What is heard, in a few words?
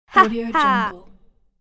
kid pretended laugh haha